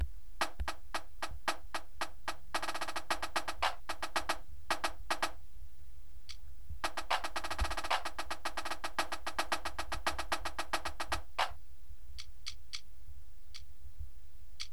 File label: snare, drumline